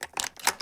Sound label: shotgun reload